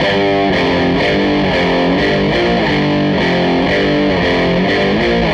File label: Les Guitar Loop